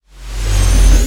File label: snake buildup; Smite; Baron Samedi